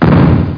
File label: shotgun; ARCADE; NOS